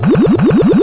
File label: block Hit